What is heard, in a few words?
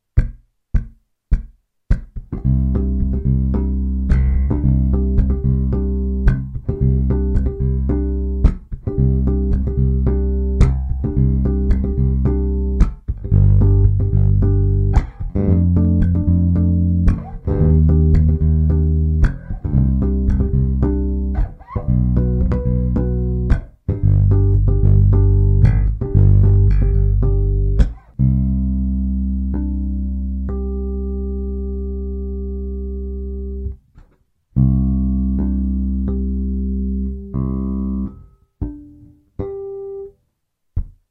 Rhythm harmonics on bass